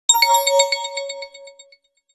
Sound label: alert glass; HTC Touch Diamond